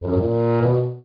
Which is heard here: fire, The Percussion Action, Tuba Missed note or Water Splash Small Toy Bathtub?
Tuba Missed note